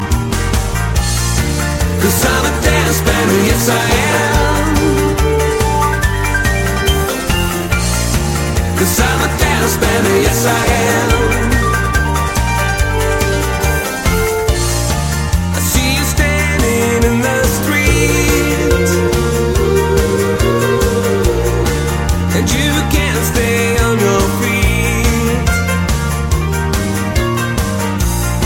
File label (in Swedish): dansbander